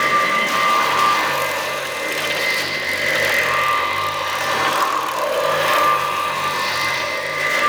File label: Energy Spiral High Pass Loop; Warframe; Ambience; Entrati